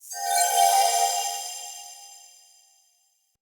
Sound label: Light Mirror Beam Hit Positive A; Puzzles